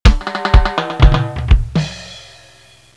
TROMMEL

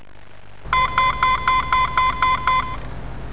WECKER

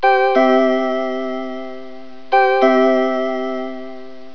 KLINGE

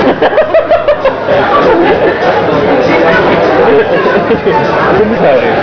Kneipe

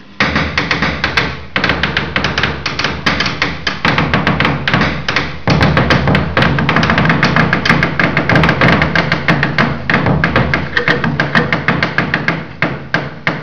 Haemmer

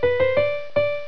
klang

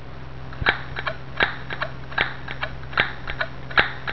SCHERE

